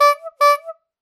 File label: bike horn